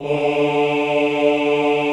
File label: OHH D2, Classical Choir; Best Service; Extended Classical Choir